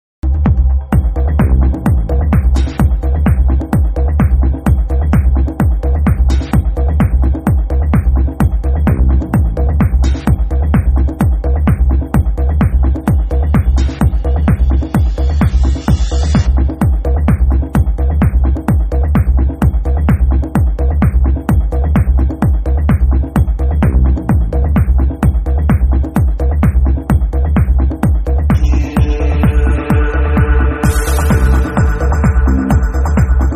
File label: Dub